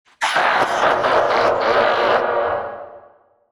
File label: tombstone spawn; undying; diretide